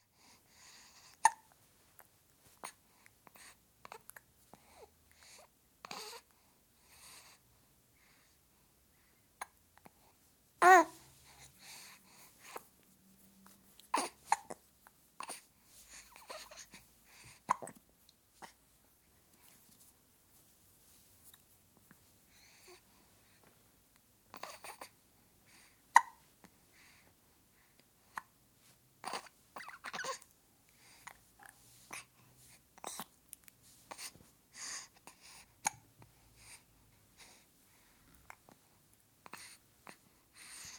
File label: 7 week old baby girl, hiccoughs; Babies; Voice; BBC